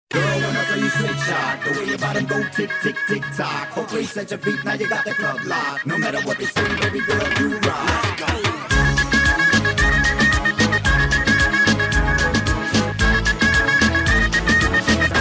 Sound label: Flut